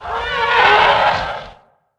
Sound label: garg pain; slender